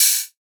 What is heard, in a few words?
OH; Hats and Cymbals